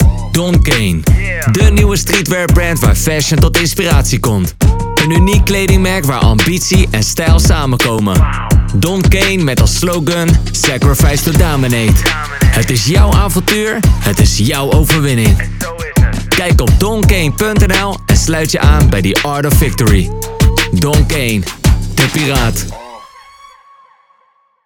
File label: beat harder